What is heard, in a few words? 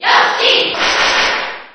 Cheer JP; Yoshi; SSB4